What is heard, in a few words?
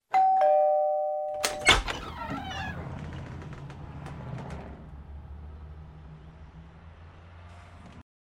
DOOR BELL & OPEN SEQUENCE